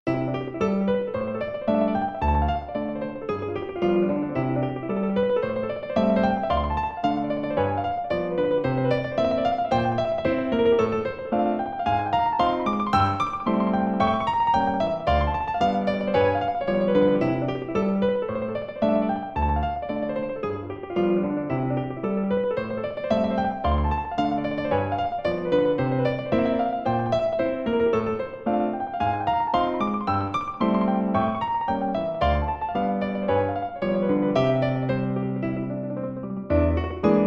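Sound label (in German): Jazz Etüde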